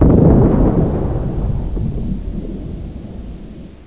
distant mortar

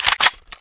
bolt pull

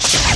fusion exploding, weapons

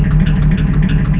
machine rumble

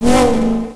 spawn block

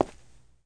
ninja step

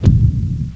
wall land

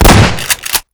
sbarrel; hl2

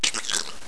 m92 clip in

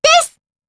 reloading

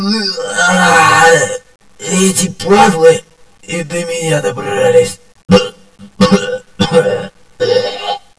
dying

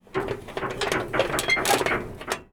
lever

